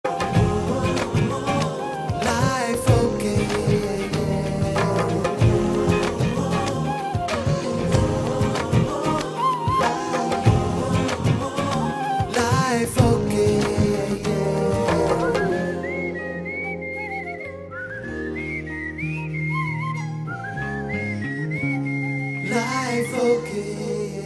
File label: Channel Tone; Life Ok